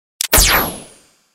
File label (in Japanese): クロスボウ発射 モノラル